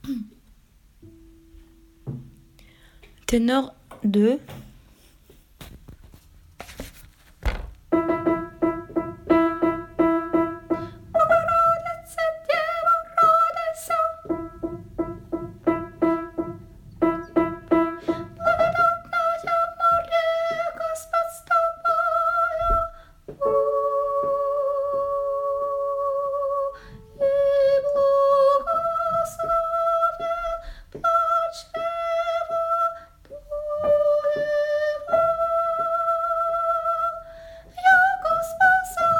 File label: bogorodice tenors; arvo part